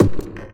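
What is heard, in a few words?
heavy ball rolling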